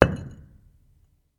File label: Stone On Stone Impact